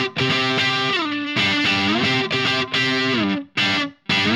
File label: Guitar, Indie Rock; Session 01 - Brighton; Sonic Foundry (Sony Creative Software); Indie Rock Anthems